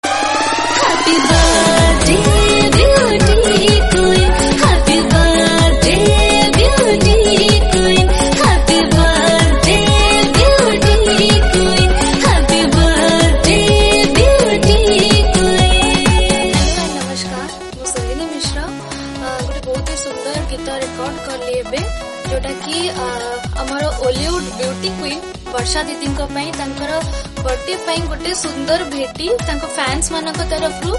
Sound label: Happy Birthday bhojpuri